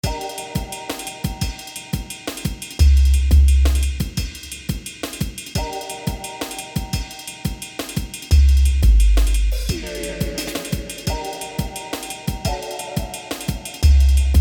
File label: Cyberpunk Blues